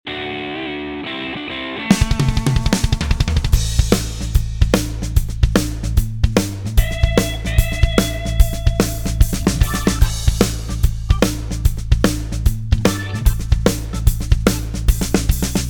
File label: Backbeat 148 Power Shuffle